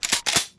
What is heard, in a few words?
reload